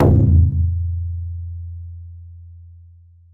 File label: drum japanese